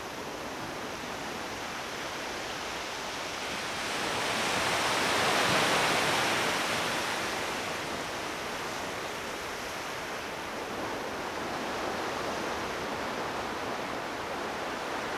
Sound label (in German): Natur Klangwelten Kieselstrand mit Ozeanwellen; naturgeraeusche; Silentsubs